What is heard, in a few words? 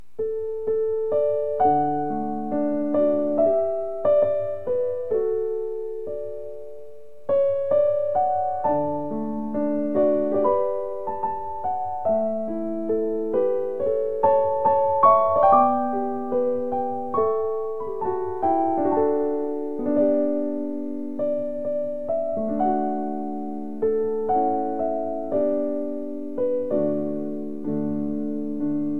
Piano